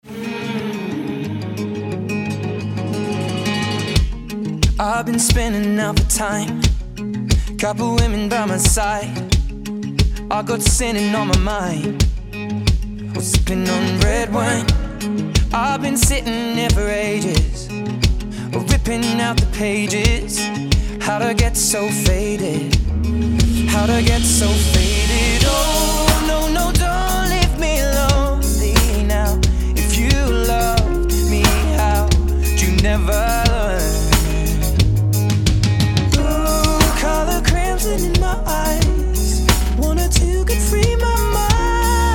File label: Drum Cover